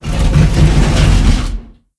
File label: Drag Drum; blue archive